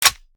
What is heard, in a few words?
bolt forward; tacz; daffas arsenal